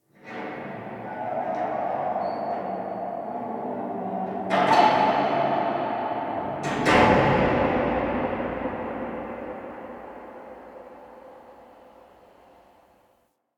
Parking Lot Distant Metal Bang; Warframe; Ambience; Nineteen Ninety Nine

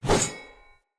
Short Sword Whoosh; Weapons; Enemy; Swords; Short Sword Elite

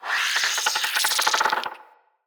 root spider vocal chatter; Animals; Spiders; Vocals

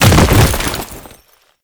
Bodyfall; Warframe; Lasrian Soldiers; Foley; Body Falls

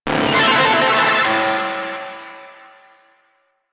Arcade Fail; Nineteeen Ninety Nine; Snake Game

